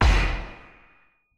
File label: wild Land; netent